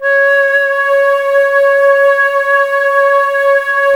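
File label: flute, Woodwinds; Sample CDs; Miroslav Vitous; Woodwind Brass Ensemble; Banks W&B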